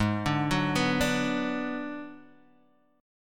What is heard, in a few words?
G sharp Minor 7th Flat 5th G sharp down Guitar Standard; G#m7b5 arpeggiated; JGuitar